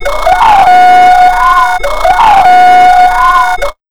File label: JMP 130 FX, Big Beat Drumloops; JUMP 130